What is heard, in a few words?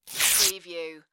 Paper Tear Rip